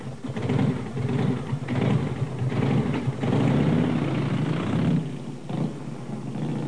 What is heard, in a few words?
start car